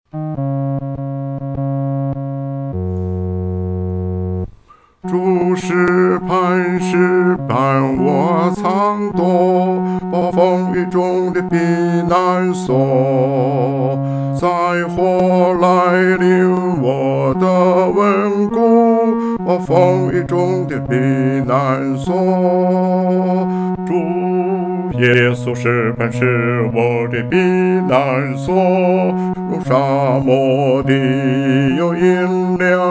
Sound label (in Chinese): 独唱 第四声